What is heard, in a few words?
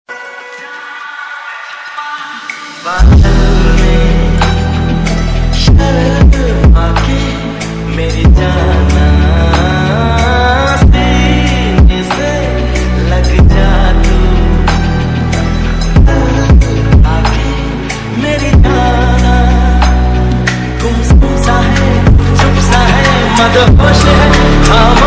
lofi version ringtone; hum dil de chuke sanam